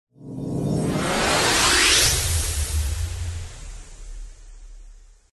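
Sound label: sound of a time machine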